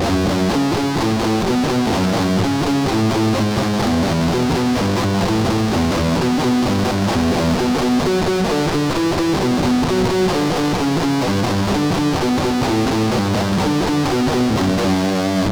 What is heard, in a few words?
Iron Riff